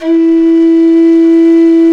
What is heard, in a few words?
Suling E3; Multisamples; Digital Sound Factory Planet Earth